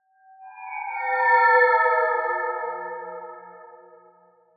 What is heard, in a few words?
ambient abstract metal evo; Half Life Model Pack